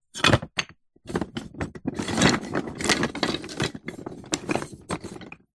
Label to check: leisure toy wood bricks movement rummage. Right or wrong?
Right